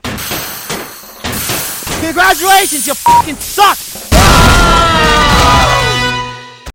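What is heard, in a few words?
emotional cry; sfm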